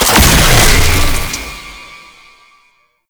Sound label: Sniper Last Shot, Weapons; Warframe; Sentient; Caliban Prime Sniper